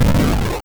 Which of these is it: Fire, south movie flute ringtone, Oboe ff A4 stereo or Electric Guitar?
Fire